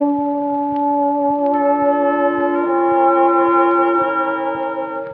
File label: horns, Loops